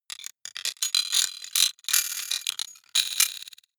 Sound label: mechanical click rattle, Roboter; comedy-cartoon; soundpool